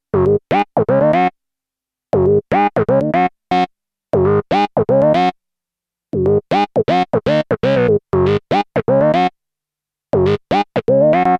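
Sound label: synth, Compact analog monophonic synthesizer; MFB nanozwerg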